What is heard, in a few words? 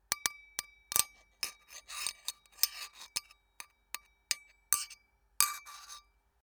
tool box